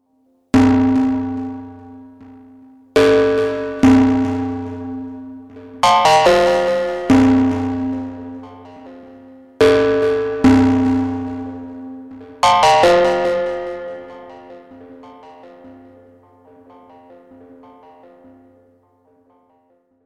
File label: Sh-4d Model FM Drum